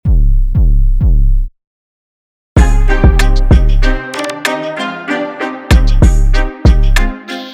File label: Aggressive